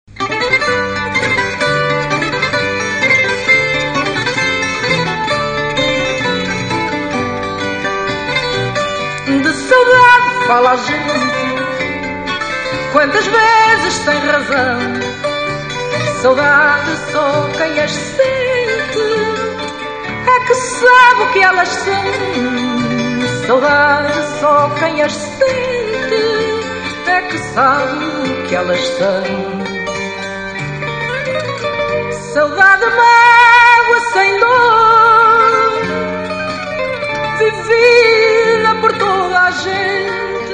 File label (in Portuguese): Fado Menor e Maior